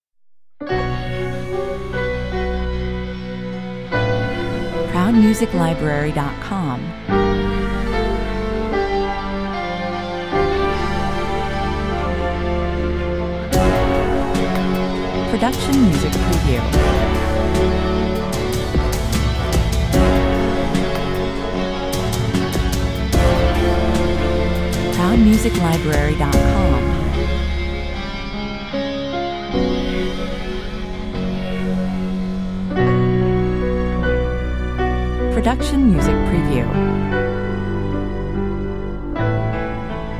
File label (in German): Melancholie und Trauer verpackt im modernen Score-Gewand, 149 bpm